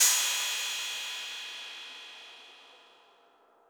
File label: Crash; BBoy Maniac drumkit